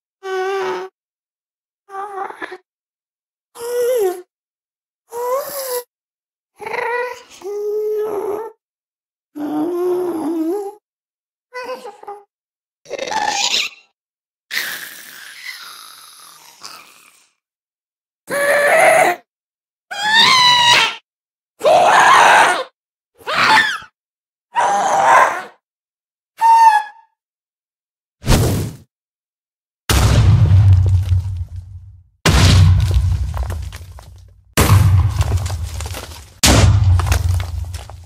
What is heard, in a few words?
Minecraft Ghast; گوست در ماینکرافت